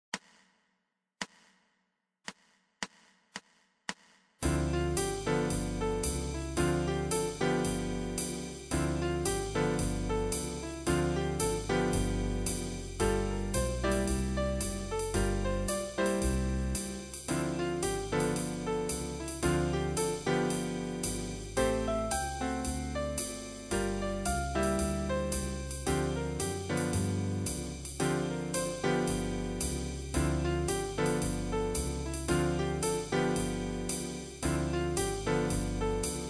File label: Jazz with drums